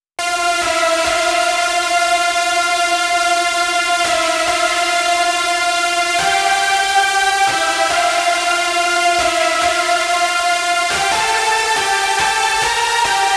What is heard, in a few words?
Pad Chorus, 140 BPM; Chorus2; Vengeance Samples; Song Kits; Take Me Away